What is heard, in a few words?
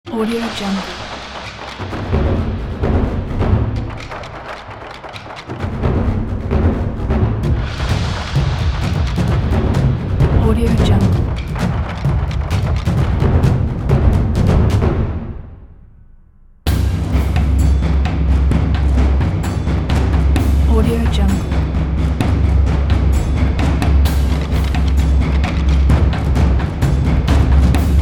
taiko